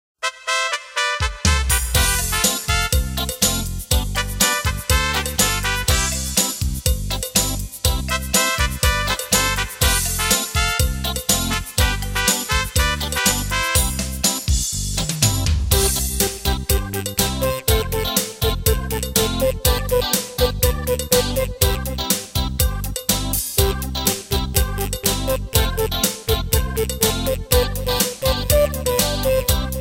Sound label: instrumental